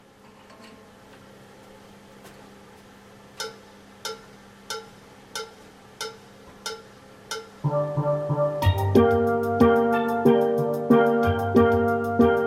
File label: alto solo tenors; bella-ciao